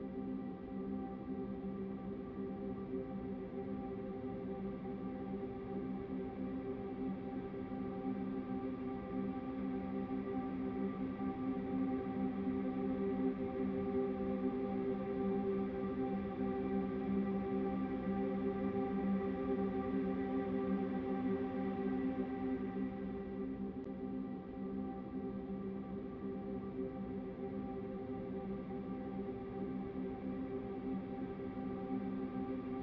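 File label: chamber ambience loop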